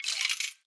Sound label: win, baccarat; baccarat2